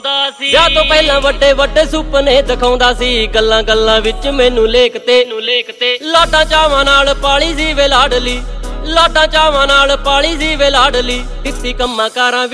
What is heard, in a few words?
music punjabi ringtone